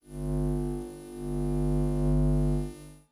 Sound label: boss force Pull Loop; unsighted; enemies